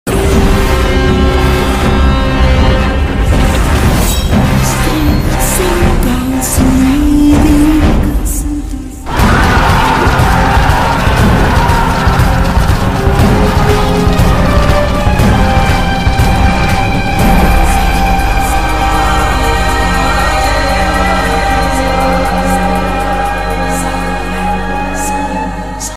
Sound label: mass bgm ringtone; virupaksha